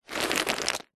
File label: batteries aa many pick up scoop from carpet; foley; zapsplat